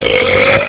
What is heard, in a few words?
belch